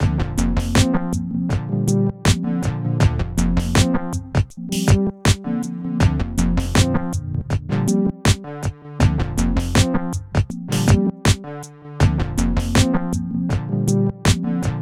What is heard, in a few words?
simpler synth